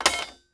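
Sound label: pipe drop creaky wood; objects; Star Wars Obi-Wan; XBOX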